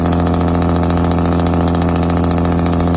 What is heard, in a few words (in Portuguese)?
motor boiadeiro